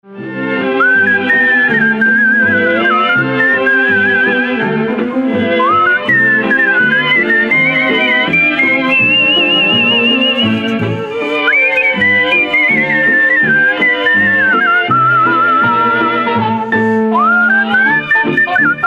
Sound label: Whistles